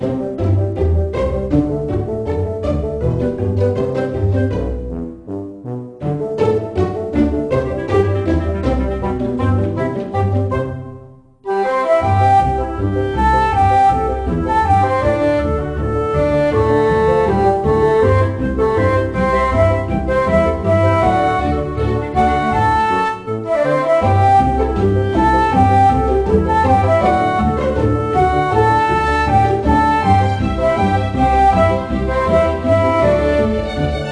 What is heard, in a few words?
menu music